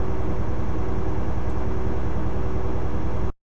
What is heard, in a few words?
diesel idle; ufoai